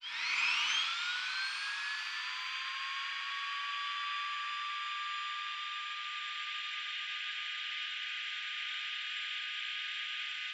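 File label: Aircrafts A-10 APU Start Cockpit; DCS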